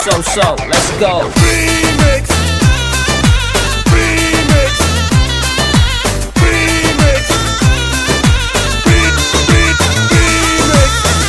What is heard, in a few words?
Arabic ReMix